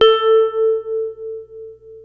PPG A3, Rare Synthesizer; Hollywood Edge; Giorgio Moroder Rare Synthesizer Collection; PPG.2.3

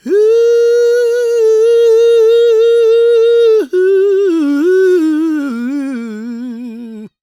GOSP MALE, SOLO; Spectrasonics; Vocal Planet

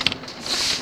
MACHINES

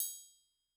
Xfade Tri, Yamaha DTXpress-II, Perc

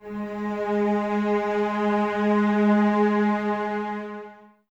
Cellos G#3 Expressivo; Miroslav Vitous; String Ensembles

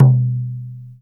INDIAN DR F#4, INDIAN PERC; Spectrasonics - Heart of Asia CD2

